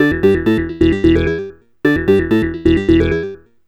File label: Bass; Sonic Foundry (Sony Creative Software); Machine Language